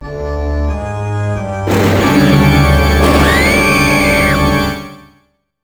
Horror

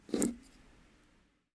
foley control; The 39 Steps

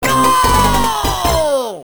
Goal CPU Victory; escape kids arcade gamerip 1991; Smell Bad

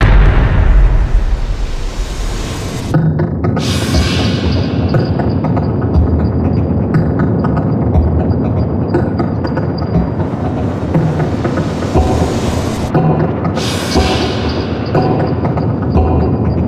Bridge Ambient

